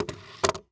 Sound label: Pistol Set Down On Empty Table, weapons; AdobeCC